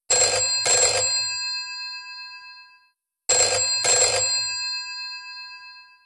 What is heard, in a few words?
Aussie 1920s bell 2 rings normal